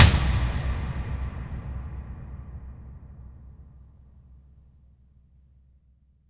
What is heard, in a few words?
toms, cinematic impact, drum